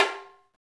Tambarime; Club-50 - Foundations Roland; Exotic Drms

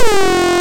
FIX SYNC, ARP Synths; Analog Odyssey; E-MU Formula 4000 Series Vol. 3 – Analog Odyssey

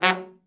TROMB; Best Service Gigapack 1; BR TROM

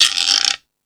Percussion, Drum Machines

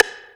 Percussion Hits; Zero-G Jungle Warfare 1, 2 & 3; 90 s Sample CDs

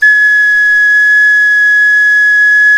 Clay Flute

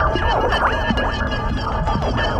Bop Shoo Lada, Noise Percussion; Sonic Foundry (Sony Creative Software); Ma Ja Le Ethereal Textures

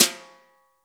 BRUSHES

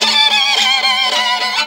VIOLIN LINES; Best Service; World Colours

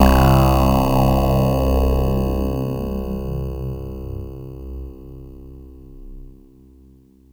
C1, Synth Hits; Zero-G; Soundclash Nu Dance Electro; Acidized

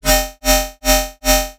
Phase Plant, bass

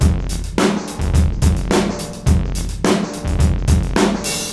Beat Loops; Zero-G; Monster Beats

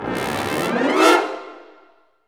BRS RIP, LOW BRASS; Roland - LA Composer vol. 1

